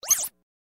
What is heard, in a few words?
Highpitched Compute